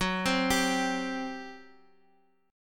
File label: G Major 7th Suspended 4th, F sharp